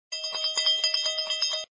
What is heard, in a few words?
Bell; Shop Empire 3